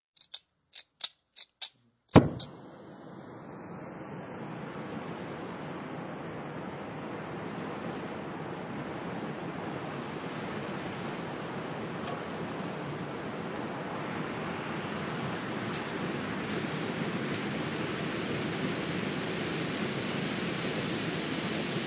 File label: Beeps; 헐리웃특수효과음; 신호-경적